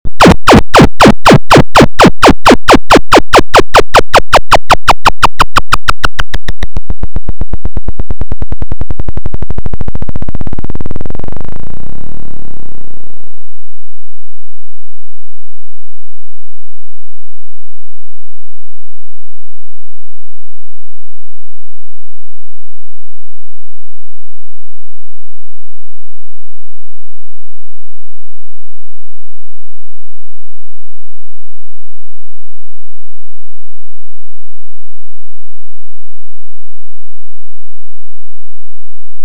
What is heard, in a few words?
Noise